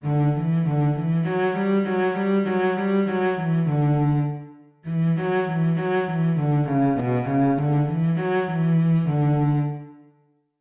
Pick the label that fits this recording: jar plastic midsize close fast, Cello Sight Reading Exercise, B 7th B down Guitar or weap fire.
Cello Sight Reading Exercise